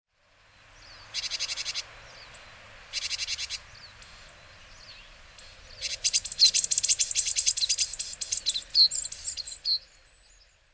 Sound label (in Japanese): sijuukara, hyogo